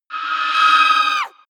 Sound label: wraith attack; schreie